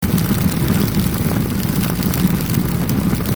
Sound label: Wilderness Retreat Roaring Bonfire